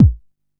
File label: Kick, Drum & Bass; AMG - Prototype Drum & Bass